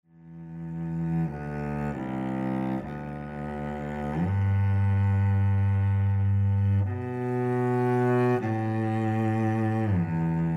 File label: Cello Solo; Rich Oak